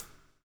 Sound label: BLUES TK, Backbeat; Spectrasonics - Backbeat